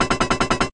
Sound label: Chain